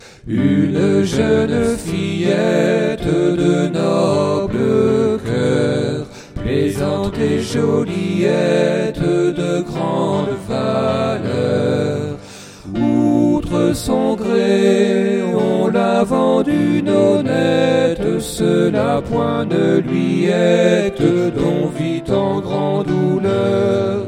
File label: Tutti; Une jeune fillette